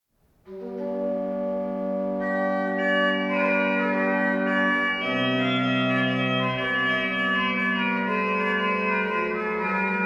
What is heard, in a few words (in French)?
recit de cornet sanctus messe pour les couvents; F couperin